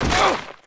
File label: FALL, Rider